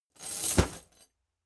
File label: boxing punching bag metal chain fall down; boxen